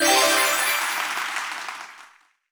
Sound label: Achieve Popup